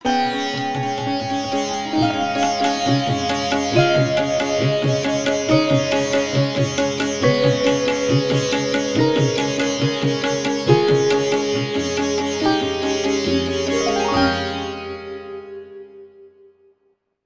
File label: Tuba